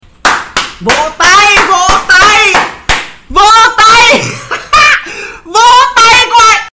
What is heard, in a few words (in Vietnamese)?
Am thanh tieng vo tay